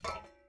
ui pickup chainlink; Rust

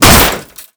television fall; Call of Duty Modern Warfare; physics

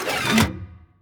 gun reload; World of Tanks

